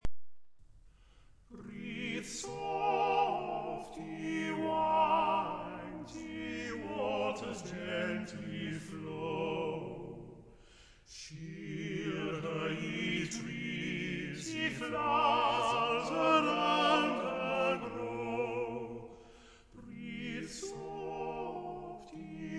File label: Breathe soft ye winds